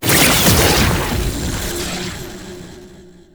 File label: Mirror Portal Activation; Warframe; Duviri Murmur